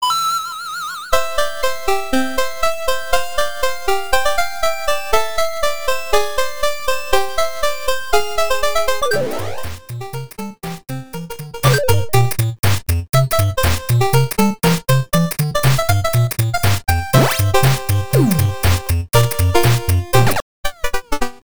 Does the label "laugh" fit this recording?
No